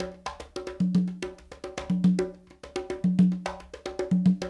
Latin Congas, African Contemporary; Spectrasonics; Supreme Beats

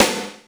SNARE, DRUMS; Crypton - DrumBase5000; T1 DRUMS

